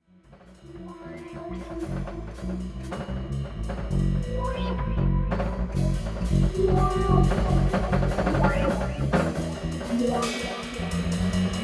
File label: Rehearsal Segment